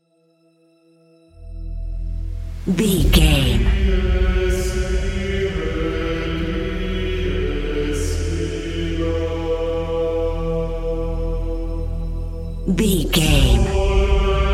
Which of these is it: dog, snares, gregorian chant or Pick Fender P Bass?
gregorian chant